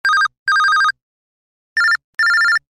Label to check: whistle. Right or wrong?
Wrong